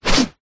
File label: warfare axe or other heavy weapon swing